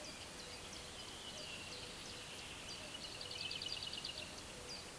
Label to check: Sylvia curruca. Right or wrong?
Right